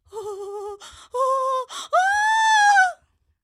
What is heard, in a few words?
Collapse Long